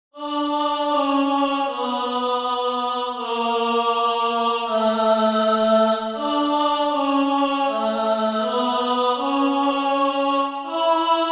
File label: alto